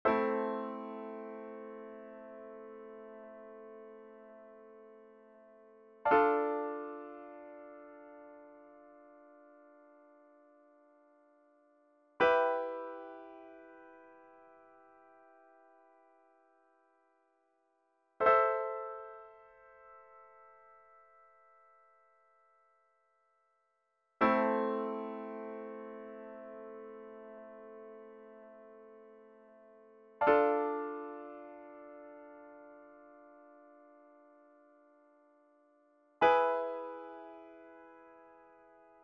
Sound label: Minor Chord Progressions A MINOR SLOW